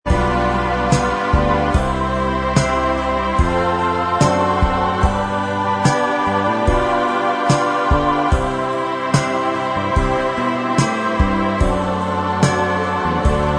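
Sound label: instrumental, backing tracks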